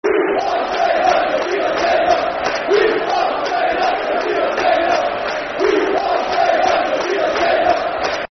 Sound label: We Are Staying Up, Football Chant (Soccer Songs); Port Vale; Port Vale Pvfc